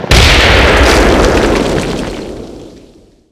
vehicle death explosion combo; WAR

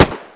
m3 ringout; WAR

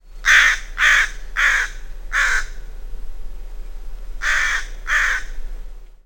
crow; WAR

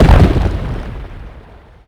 medium impact generic; WAR

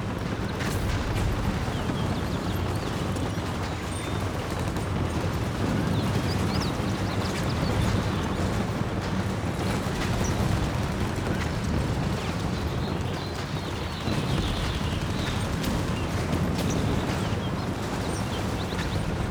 in battle; WAR

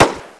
m3 ringout; WAR

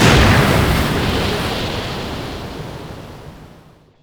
large water explosion; WAR

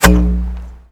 mortar tube; WAR

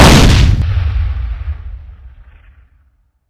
75mm gun; WAR

